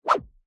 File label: cartoon swipe fast swish; zapsplat